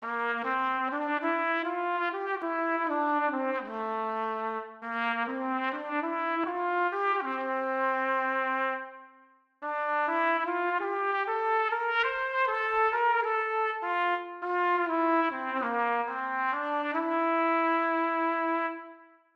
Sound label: trumpet